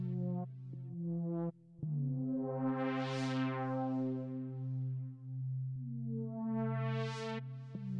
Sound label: Trance